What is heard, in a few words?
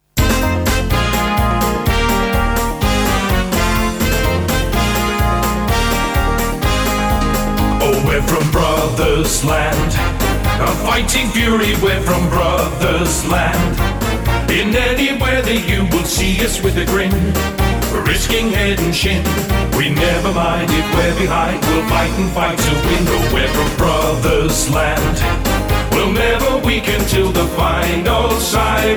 Club song